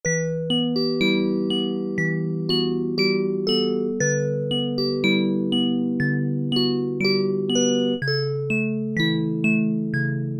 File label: KLINK PIANO, Oberheim OB-8; Factory Patches